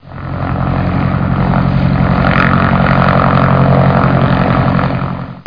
PROP PLANE

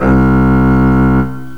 Cello; Strings & Orchestra; GMPlay

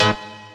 RAVE, synth

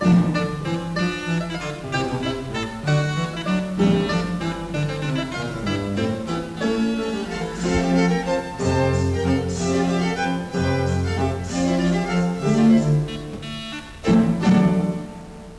music; Leonardo The Inventor; Broderbund; Riverdeep

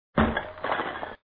shatter Sound; darkmelt; Puzzle

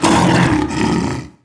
mau hit; DAoC; Toa; Gamestar

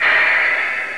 gear up

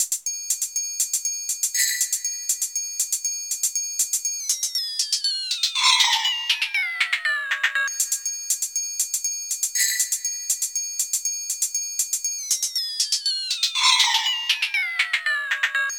drum; 120 BPM

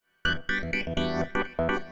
SYNTH HOUSE 125 A; eJay; Keys

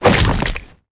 creature hit hive

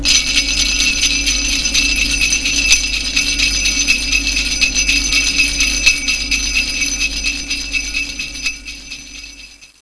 jingle

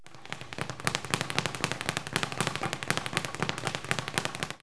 speed bag, sports ring; KABOOM! - Wild and Wacky Sound Software

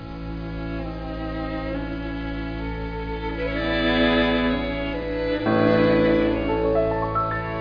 strings; CRUSHERX-LIVE 2.37D

